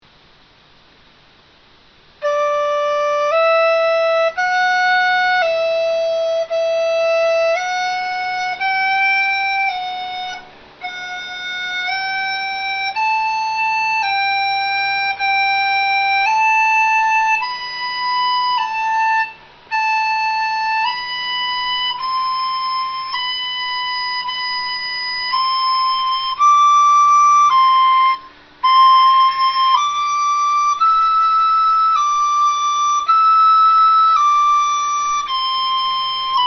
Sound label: dizi